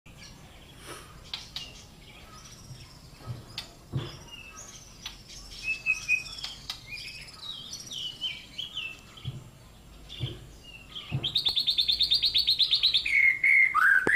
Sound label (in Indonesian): Suara murai rasa lovebird